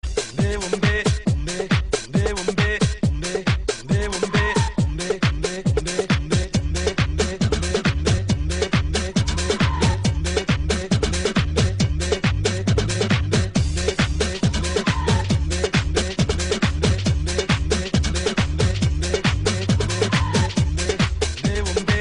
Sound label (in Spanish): Musica Africana